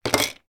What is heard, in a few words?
household vitamin tablet container knock over on table